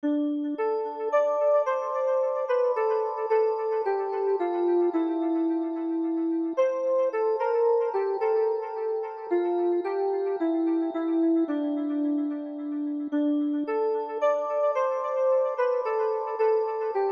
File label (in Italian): voce synth